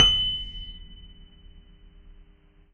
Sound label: F#7 MP, Piano cp80